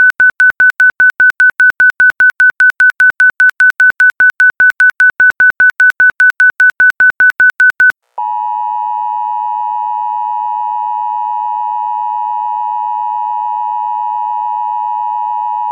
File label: Flash Flood Warning Phoenix